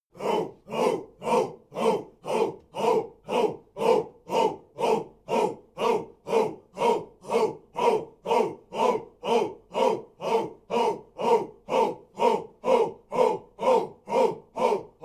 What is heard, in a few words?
chants simple